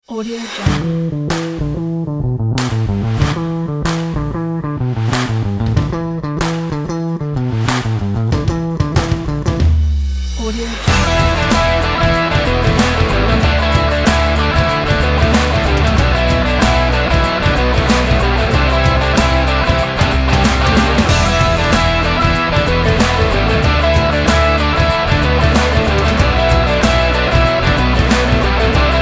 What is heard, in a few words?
driving indie rock